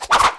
dryad attack

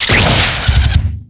crystal impact

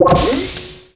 attack; banaspaty

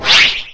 attack; anubis

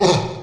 dande damage

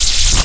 attack; YORTUS B

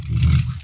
airboat stand; boss

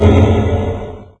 zombie slaughter stand

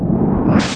soul gathering, effect; soul ascetic

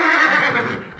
attack; cornus

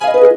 RHYTHMICAL WAVE

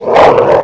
giant caput die

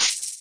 leech move

